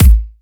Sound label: Hard Kicks; Vengeance Samples